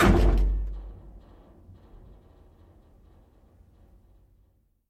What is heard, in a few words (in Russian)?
otgolosok udara po metallicheskomu predmetu